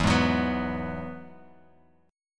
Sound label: E Major Add 9th, Guitar Open G